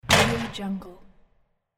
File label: basketball rim shot